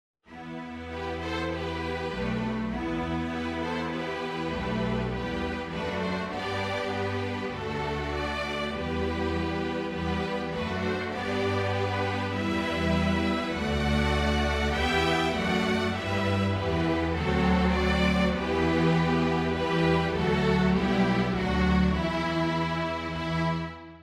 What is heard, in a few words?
string orch